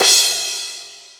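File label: R8 CRASH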